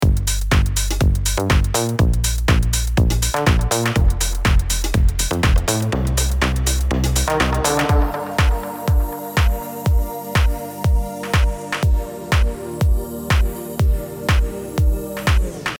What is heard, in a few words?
FX Send Automation